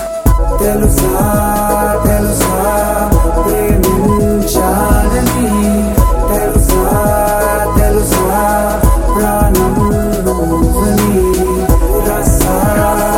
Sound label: Male Version Tone